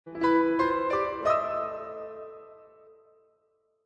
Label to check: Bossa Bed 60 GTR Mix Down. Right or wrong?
Right